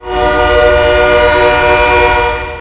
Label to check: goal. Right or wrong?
Right